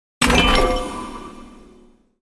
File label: Chest open reward; gfxsounds